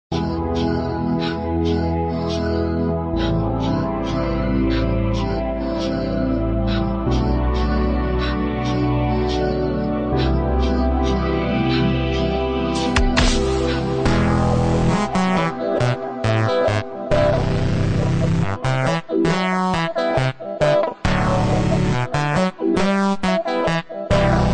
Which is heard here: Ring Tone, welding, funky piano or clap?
funky piano